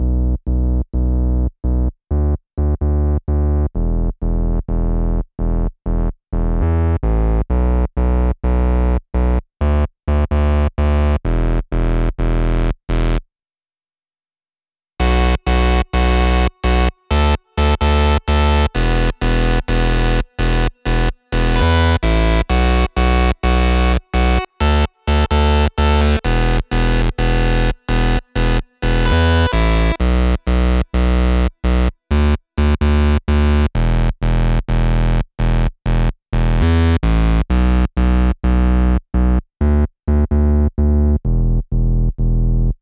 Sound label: Female Bass Mix, 128 BPM; Vengeance Samples; VTDS2 Song Kits